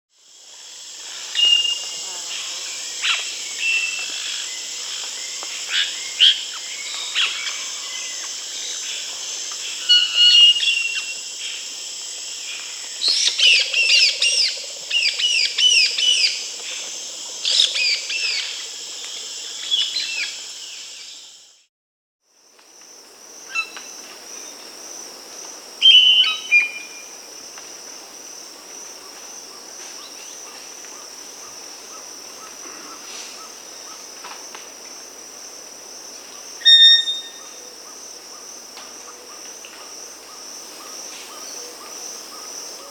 White-bellied Parrot (Pionites leucogaster), Peru, Bolivia; S. America